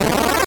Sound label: 8bit se